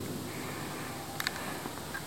yell

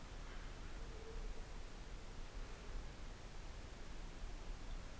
moan

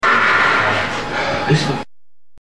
Demonic Scream

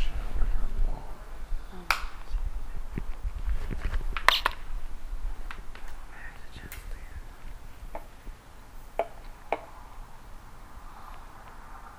unexplained noises

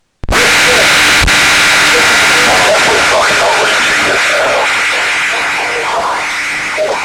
E.V.P. unedited

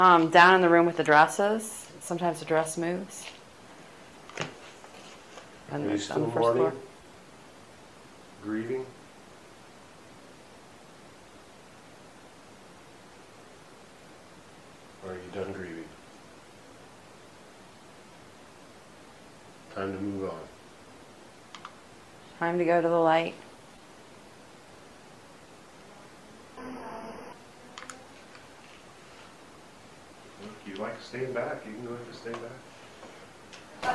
laugh and other gibberish

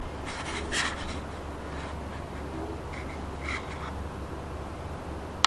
animal

